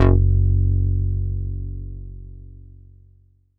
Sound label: Pulseboy f#2, Korg Poly-800